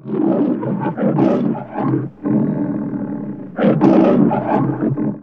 lion growls; animals; best service Blue Box

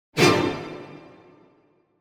Symphonic Hit Mixed Up - Strings; Hollywood Edge; sound designer's toolkit v2

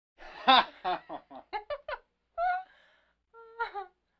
Vocal effects breathing Moaning Baby

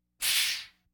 Laugh tracks

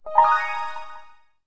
get item; firefight

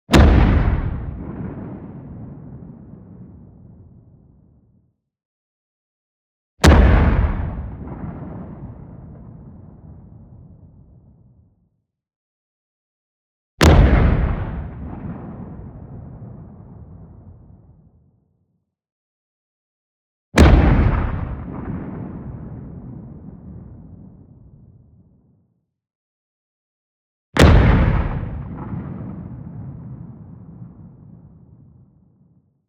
EXPLOSION LONG Snappy Thunder; boom library; Anime Essentials

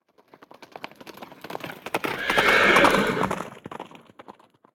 animals horse; Blind Drive

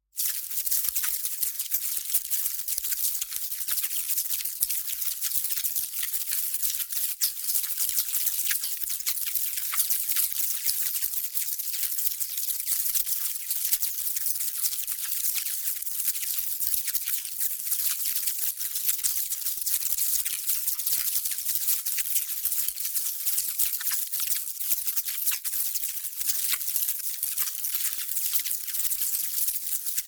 NEON PARTICLES SMALL FAST; Flashes Of Light; boom library

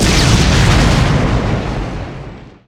Explo Medium; Destruction

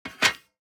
DICE on Metal, Grab, Standard, Five Dice; Samples; ArticulatedSounds; DICE Sfx Party (Extended)

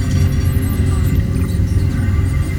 Scifi Amb Control Loop; SFX Kit; Sci-Fi Loops

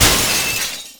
Shatter Ice Block; Destruction

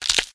BREAK Bright; stereo; Universal Sound FX; BREAKS & SNAPS

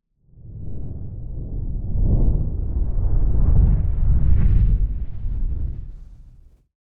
Whoosh Ultra Low Rumble, Drone Slow; Hollywood Edge; sound designer's toolkit v2

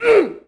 Boxing Female Punch Grunt

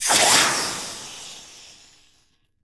Steam Sizzle Hit

